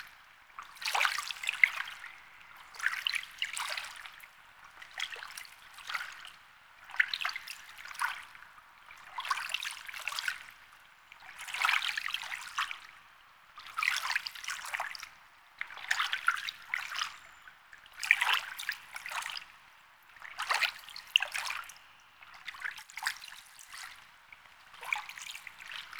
Exterior Lake Water Laps Loop; Warframe; Duviri; Ambience

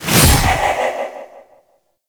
Sow Target Hit; Warframe; Wraith

